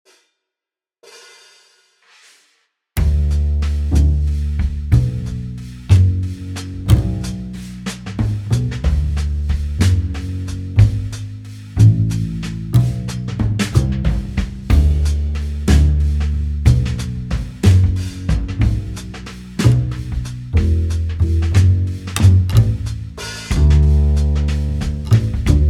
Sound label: backing in d